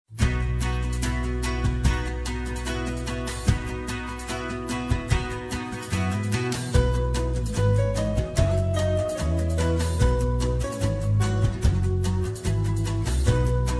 ez pop

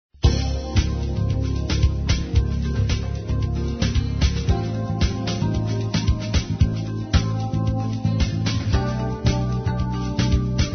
jazz pop

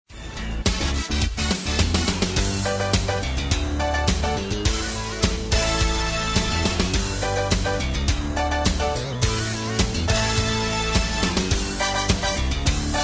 pop dance

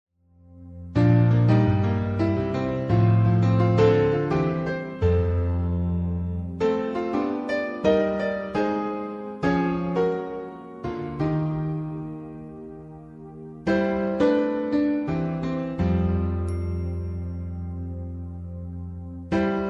acoustic piano

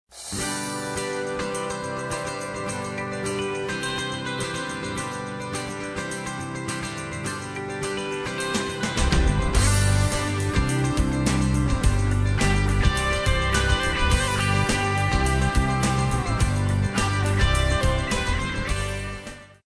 pop rock altern

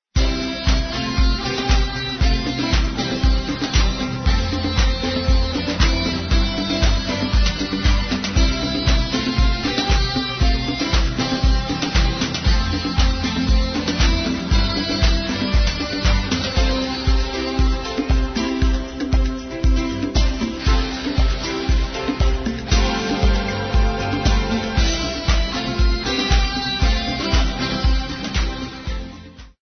pop rock

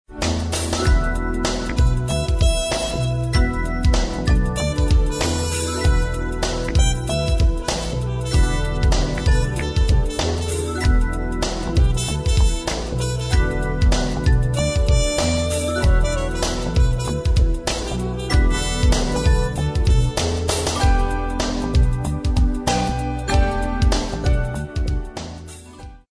jazz pop

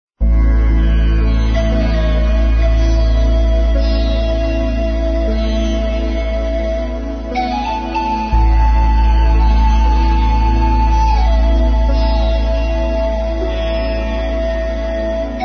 new age

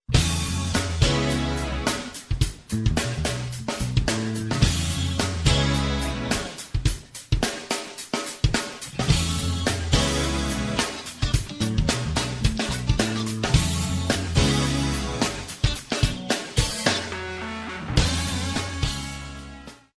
jazz funk

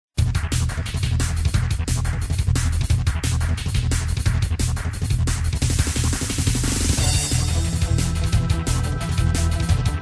pop dance

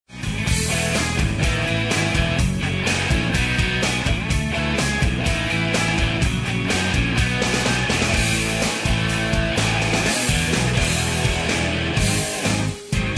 pop rock

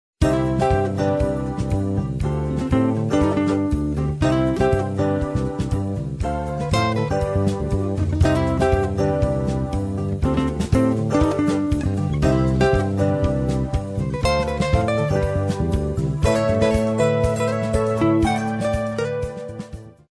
jazz pop

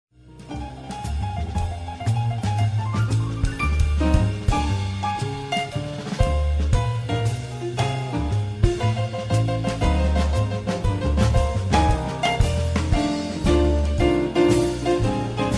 jazz lounge

